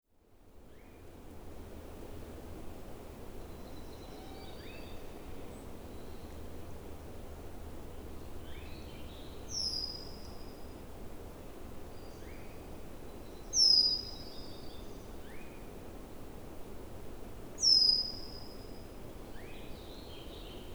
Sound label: birds; chorus frogs; Clatsop County Oregon; Microphone: Shure WL-183(x2) Recorder: Sony MZ-N707